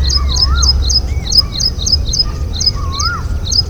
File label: Song of the Ashy Prinia Maharashtra; Shivam Chitnis and AK